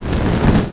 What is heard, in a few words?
fire